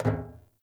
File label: Walk Metal, Footsteps; Enemy; Mockeries; Mockery